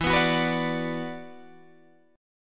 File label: E 7th Suspended 2nd Guitar DADGAD; strummed